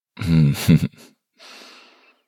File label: man giggle; giggling; ringtone; voice ringtones